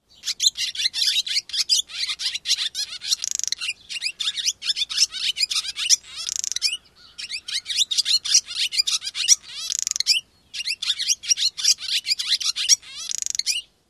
song

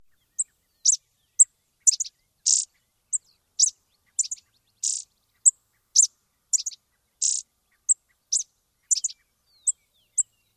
series of calls; AZ

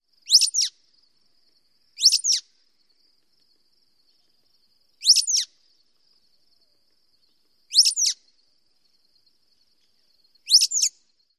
calls

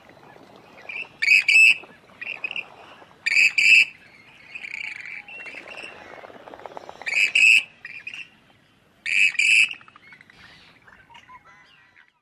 kreed-kreet

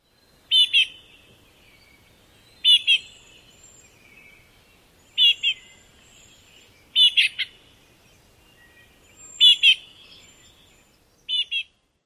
nasal begging calls of fledglings

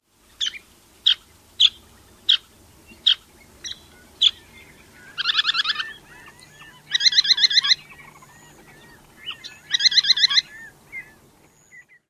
calls

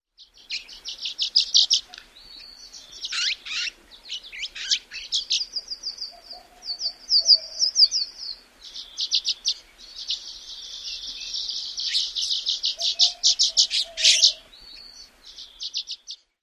calls

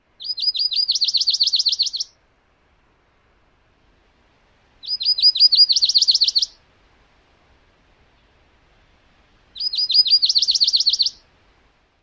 songs

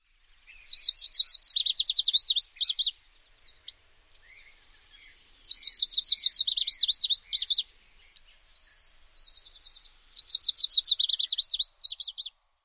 song

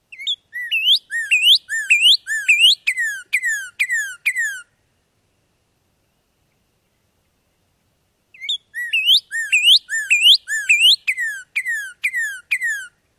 songs; NY

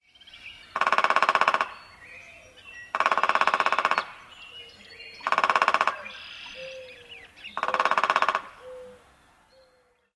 drums

